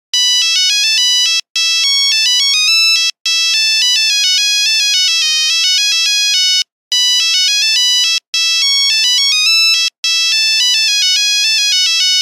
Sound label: Menuet, Nokia Buzzer; Windows Phone apps